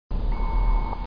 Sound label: Modem; ProOne: Netcracker Netscape Navigator